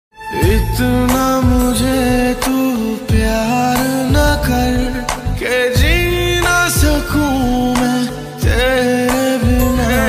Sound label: Romantic Song Ringtone